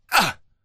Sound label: grunt expunchkick; imc; Titanfall 2